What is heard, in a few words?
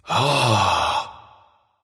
"ah", discover; smite; voicepack; ullr